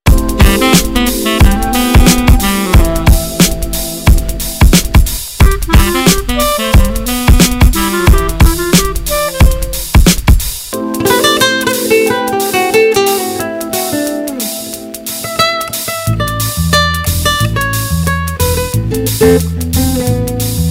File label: abstract music; Jazz